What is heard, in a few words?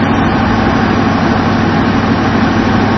American car; 暴力赛车